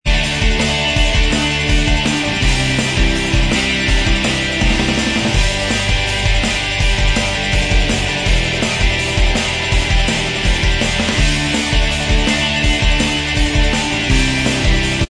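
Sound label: instrumental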